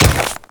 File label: Ice Step; Enemies; Footsteps; Warframe; Grineer Royal Guard